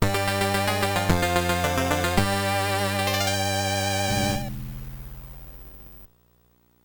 Gameover; Shinobi non Grata

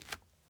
masonry jump

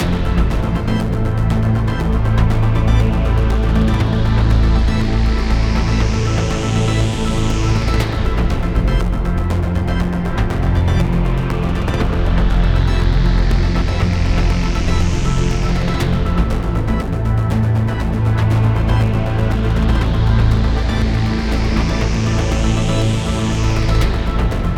Combat Heavy; Void Bastards

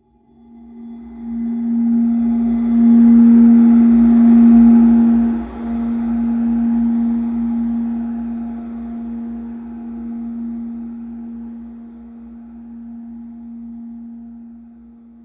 Drone

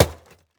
plastic hit; teardown ps5 windows xbox series xs gamerip 2022

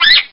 SFX RAT PAIN

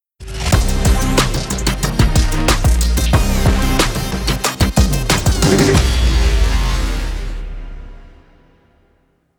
Arcade Stage Transition; Street Fighter 6 Beta; Xbox Series X/S

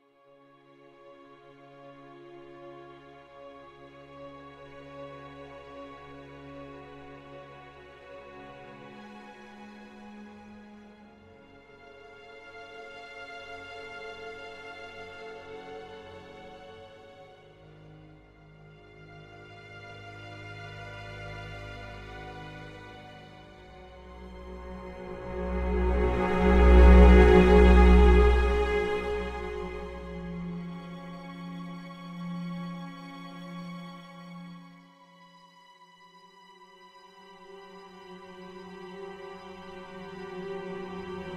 Ambient

